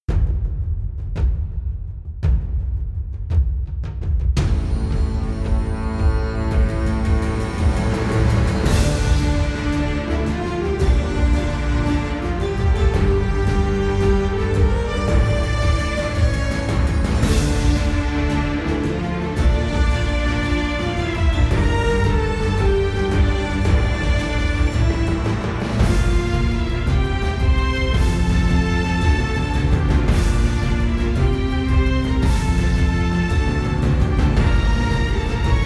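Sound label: instrumental version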